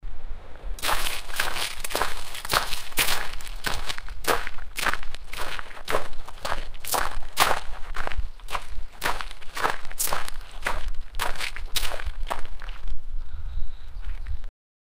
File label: a person running on a beach